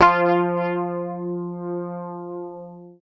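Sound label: bass, F3, left channel; PICK; Sampleheads; Will Lee Bass Library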